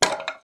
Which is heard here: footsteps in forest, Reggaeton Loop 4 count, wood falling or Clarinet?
wood falling